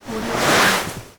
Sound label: synthetic fabric movement; domestic sounds